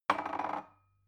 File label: glass shelve wobble